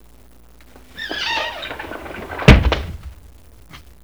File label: window